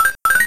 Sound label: Yoshi Egg Break, Sound effect of Yoshi Egg Break